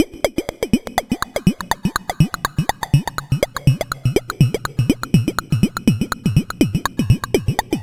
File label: Percussion Loop, Synth Percussion; Sonic Foundry (Sony Creative Software); Dr. Fink's Funk Factory